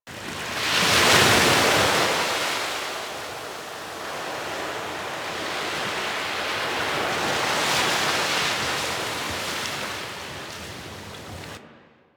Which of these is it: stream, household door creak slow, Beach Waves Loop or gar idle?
Beach Waves Loop